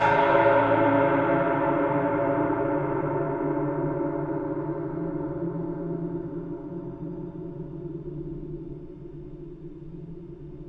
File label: DEATH TOLL; Distored Reality